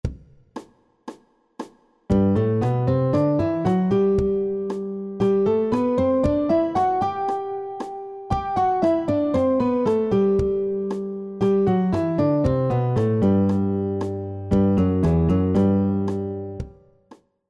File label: scale